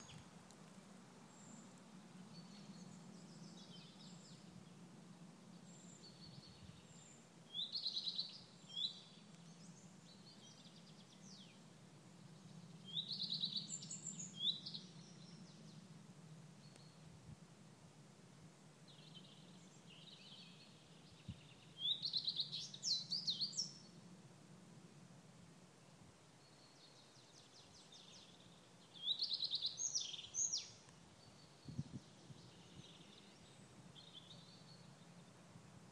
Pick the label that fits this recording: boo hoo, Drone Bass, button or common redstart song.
common redstart song